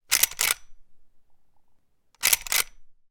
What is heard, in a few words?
gun model 1894; still north media